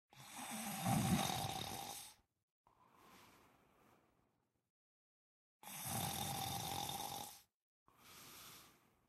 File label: snore; Zomboid